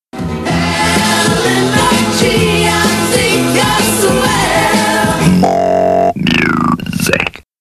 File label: Jingles